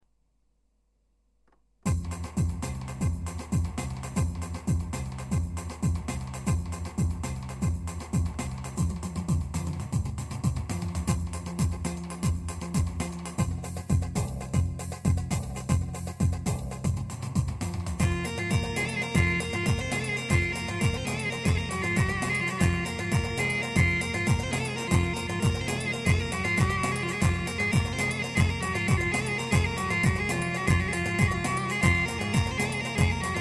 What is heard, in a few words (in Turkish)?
giresun karsilama, pa 500; turkiye karsilama havasi